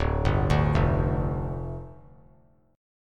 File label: D Minor Double Flat 5th, down; Dmbb5; arpeggiated; JGuitar